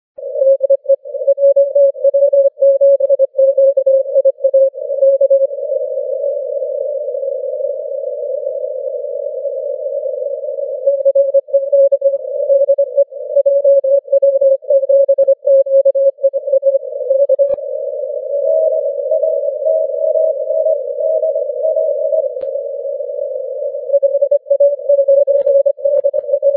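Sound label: 6m continuous wave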